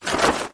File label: skill effect